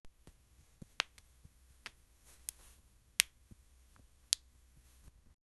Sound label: soft cutting sound of dia; material